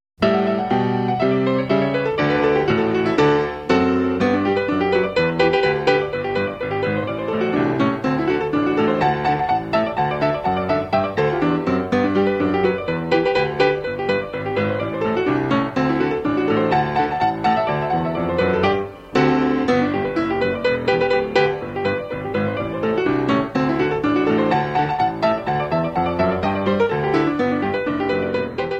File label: Clarinet Polka